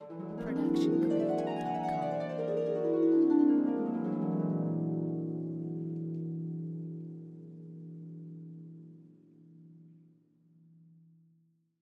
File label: fantasy gliss; soundscrate